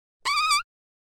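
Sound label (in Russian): короткий жалобный писк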